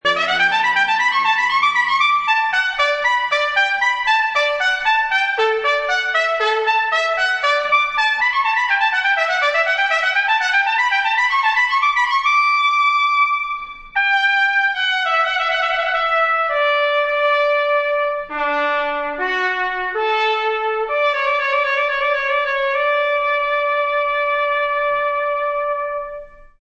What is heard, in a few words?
fanfare